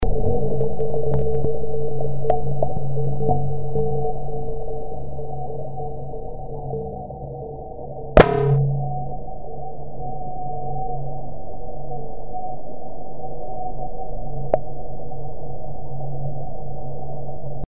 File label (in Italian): vibrazioni flyby